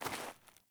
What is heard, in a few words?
Sandals Walk Snow, Footsteps; Player